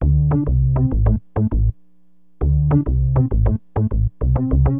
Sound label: SYN BAS, Acid Jazz Funk